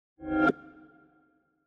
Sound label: melee attack swoosh magical effect psychic; weapon swooshes; psfx